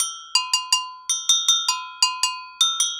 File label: agogo 80 bpm, latin